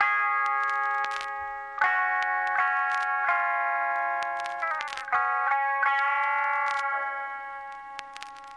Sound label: GUITAR LOOP Db